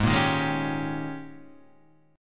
G sharp Major 7th Suspended 2nd Suspended 4th, Guitar, Standard; strummed; chord; 4,1,1,1,2,3